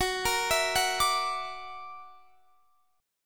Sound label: Gb Augmented 7th Gb down Guitar Standard; Gb+7 arpeggiated